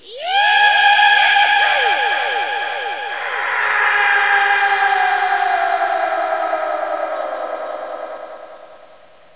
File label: yahoo; shogun